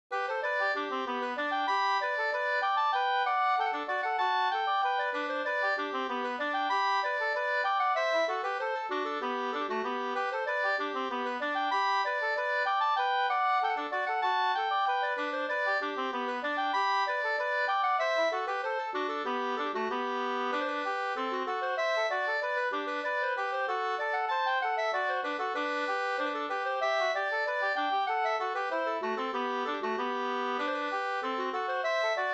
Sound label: polka; e Gas-Anders Up 2st